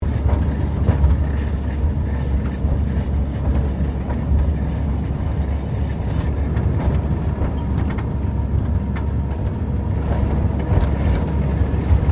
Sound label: Tram Cable Portland 1800s Diesel Motor Carriage Operating Loop; Portland Cable Tramcar; Streetcars